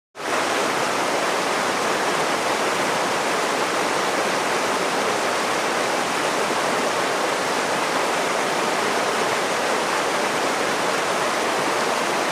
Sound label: River, Medium 2; Fast Running With Roar.